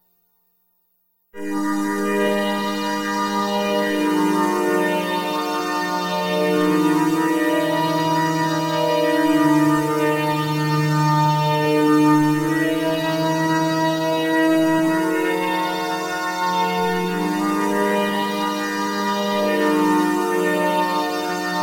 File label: string pad